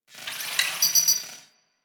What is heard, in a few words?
Side Pillar Rand, Small Cargo Room; Warframe; Ambience